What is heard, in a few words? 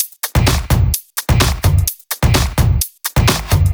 Dirty Dutch Loop